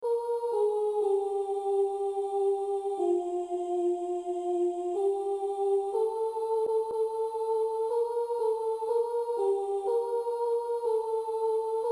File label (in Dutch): sopraan